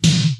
80s pop snare single hit d sharp key; snare drum samples

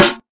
trebly snare sound a sharp key

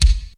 rich high end kick sample g sharp key

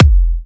kickdrum f key; drum single hits one shots; kick drum samples

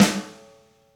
long room reverb acoustic snare sound g key; snare drum samples

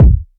short bass drum c key

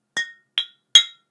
cheers bottle three Ab Bb

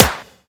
sizzle snare d sharp key; snare drum samples

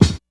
smooth kick sample f key

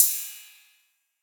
drum crash sample d sharp key, cymbal; one shot

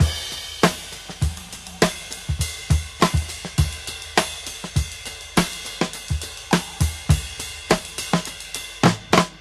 102 bpm breakbeat d sharp key

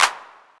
clap single shot d key; single hits one shots

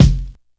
mid range kick sound f sharp key; kick drum samples

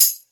good shaker percussion F key

